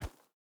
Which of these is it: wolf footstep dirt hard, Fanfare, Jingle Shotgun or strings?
wolf footstep dirt hard